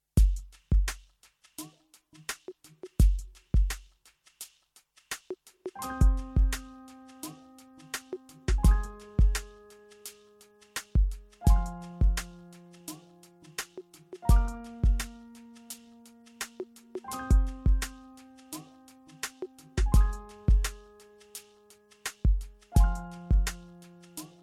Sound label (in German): Fahrstuhlmusik